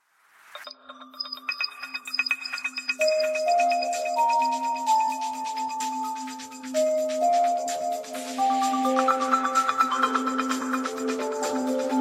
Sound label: Samsung Galaxy Pocket Plus Official Ringtone